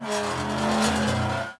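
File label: cauldron Arm Swing; objects; Star Wars Obi-Wan; XBOX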